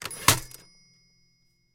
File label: Typewriter Carriag; typewriters